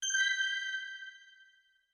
notification tilsim sesi

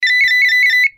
notification telefon sesi; Telefon Bildirim Sesi

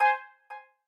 notification kisa

